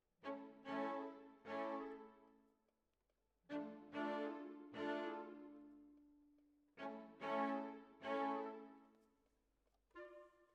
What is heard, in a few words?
Violas, jazz for piano